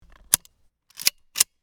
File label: Bolt rifle reload; assault rifle